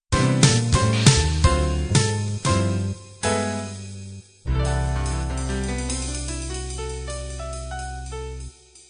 bop end

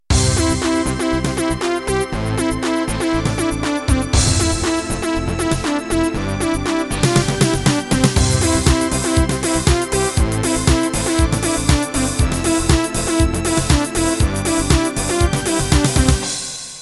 Tekno Intro

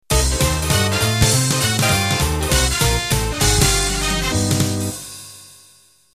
New Jive intro

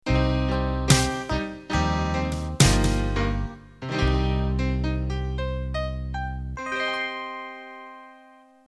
Cavern Pop End

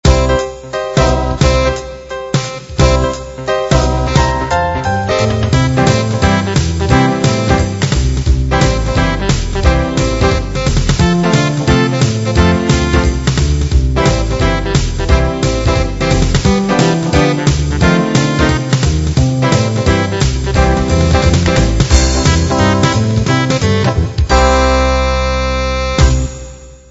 Retro Rock

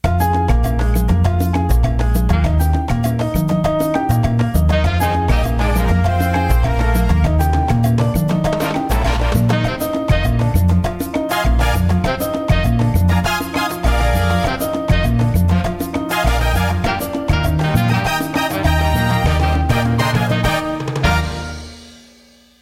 Mambo

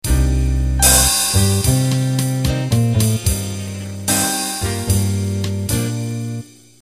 Big Band Ballad Intro

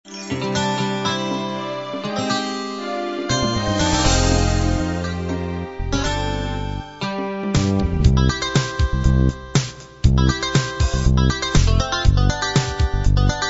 uk rb combined

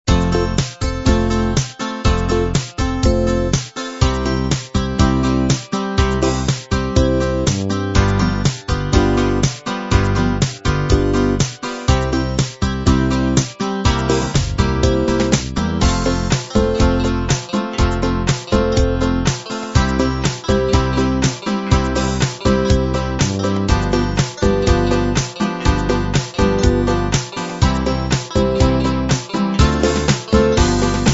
pop beat

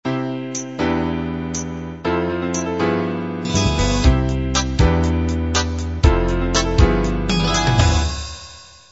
half 8-16beat intro

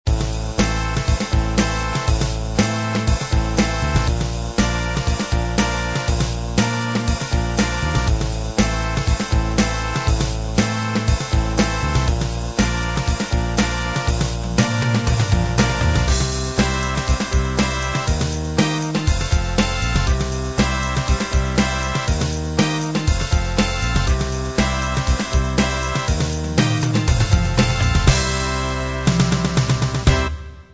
uk pop

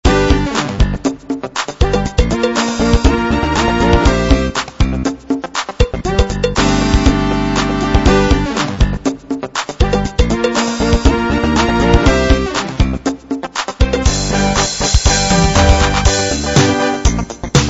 dance

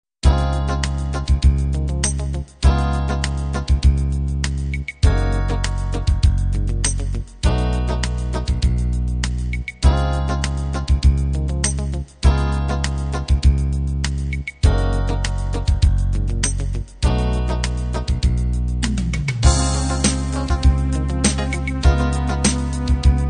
slow ballad

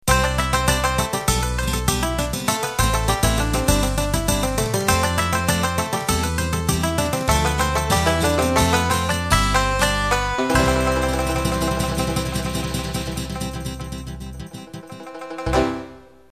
Cajun Country End

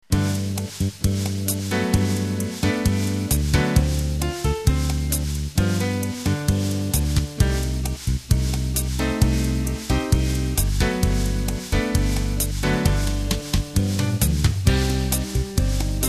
Pop Bossa

